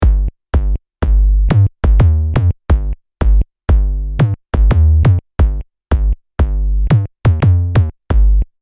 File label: Bass; Snoopy; Prophet '08